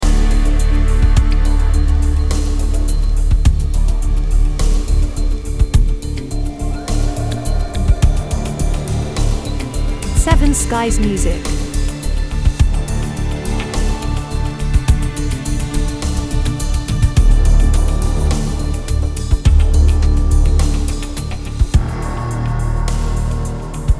Ambient Suspense

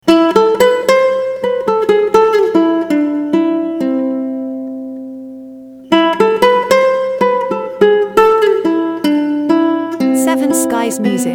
Romantic Latin guitar

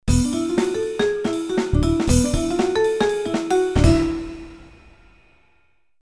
Jazzy Stinger

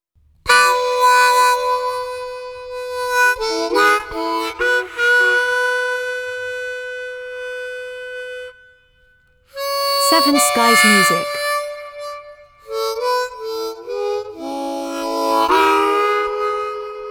Harmonic Blue

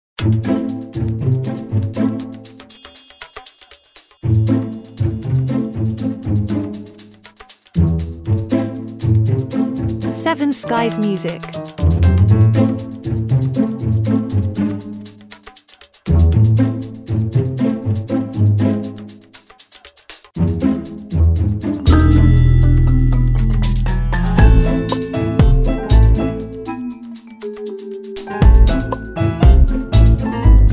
Slapstick Comedy

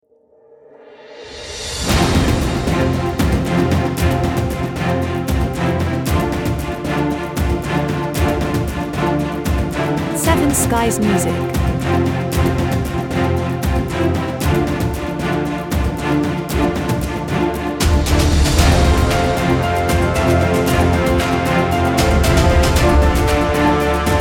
Epic Victory With Horn

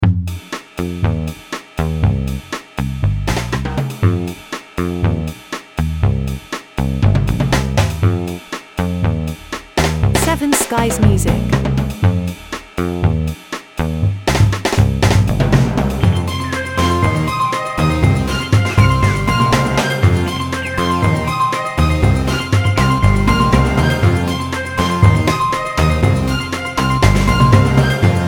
Jazz orchestral Good Mood

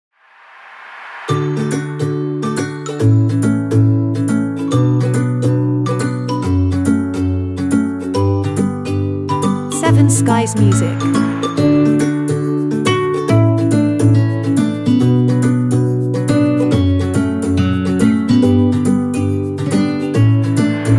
Time To Relax, only shakers

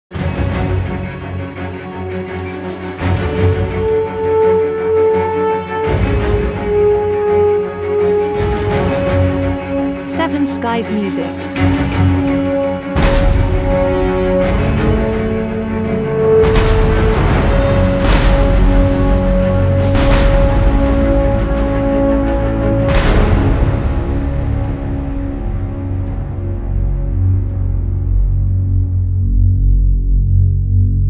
Big Hollywood Dramatic Score

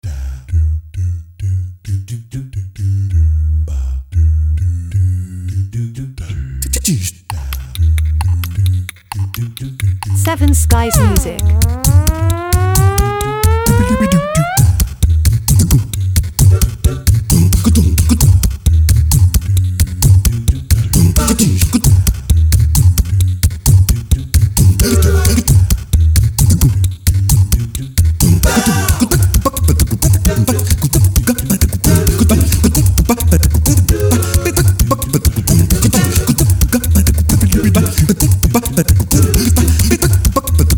Vocal bebop symphony

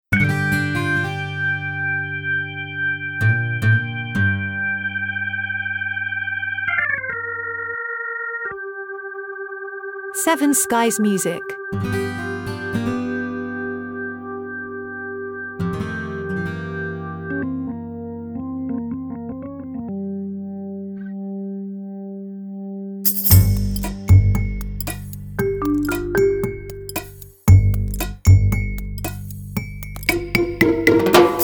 Light Comedy

